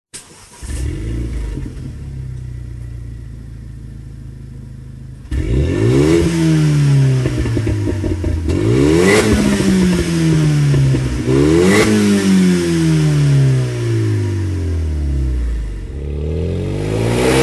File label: Stock exhaust Mégane Coupé RS